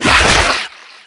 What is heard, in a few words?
parasite burst; garrysmod